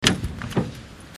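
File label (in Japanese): kuruma, car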